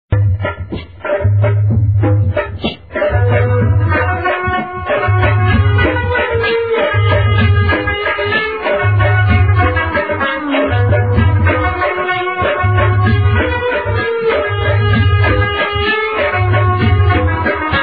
Sindhi Music

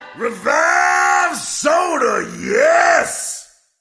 Soda Jingle; Revive

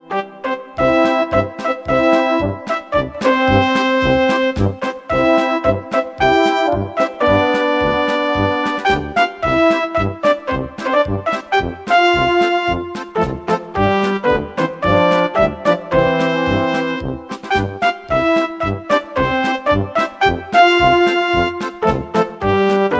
Party Sound; HTC One

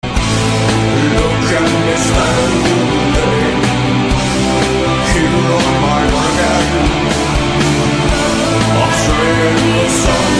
Black gothic metal

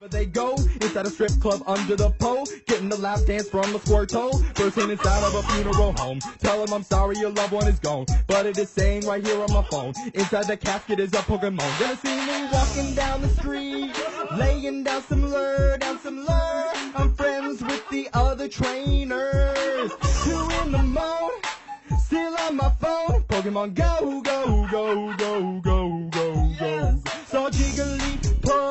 Pokemon go ringtone

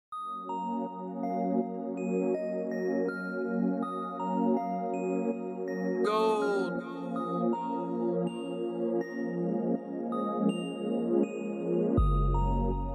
instrumental

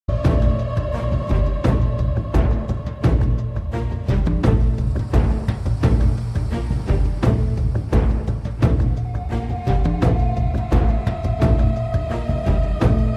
Ultimate Tone

